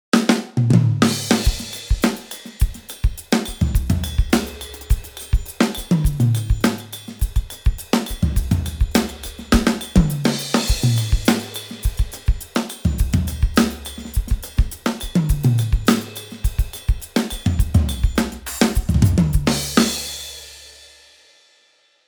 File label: drums studio legend; handy drums